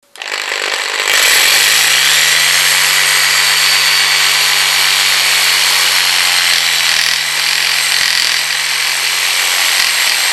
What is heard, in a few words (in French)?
Scie sauteuse